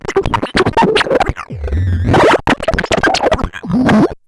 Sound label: Bent Talking Teacher, Circuit Bent